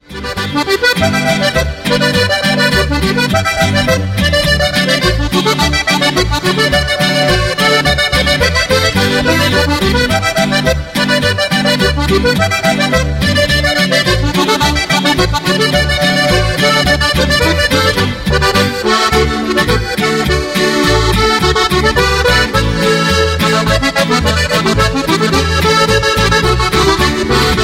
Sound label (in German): Boarischer; Rucksack